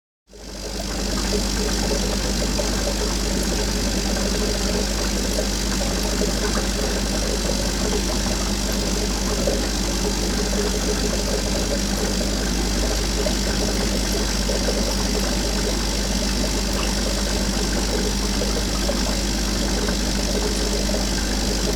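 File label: aquarium compressor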